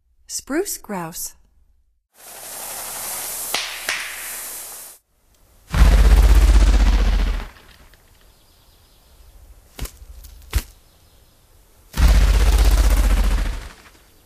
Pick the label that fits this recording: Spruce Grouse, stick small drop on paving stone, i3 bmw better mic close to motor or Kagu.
Spruce Grouse